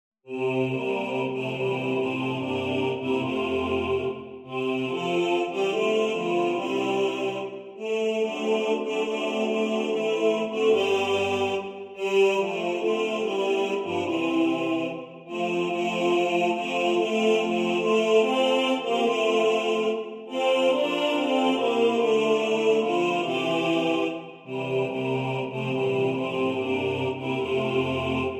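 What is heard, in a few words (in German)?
Bass 2 im Vordergrund, Männerchor; Ihr Hirten seht zum Himmel auf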